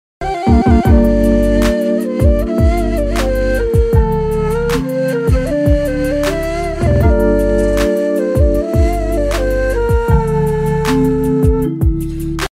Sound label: Turkish flute; فلوت ترکیه ای